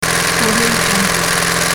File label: sewing machine fast loop